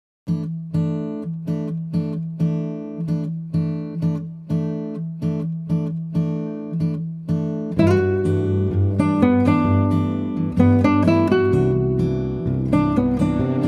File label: Bossa Orchestra ver.; Animal Crossing Wild World